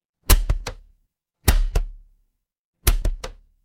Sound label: office stapler